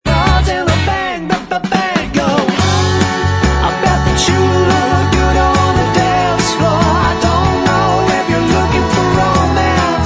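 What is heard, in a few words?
backing vocals, backing tracks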